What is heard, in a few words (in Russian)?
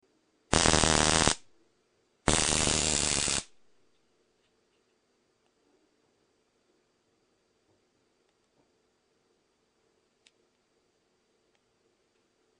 dolgij razrjad elektroshokera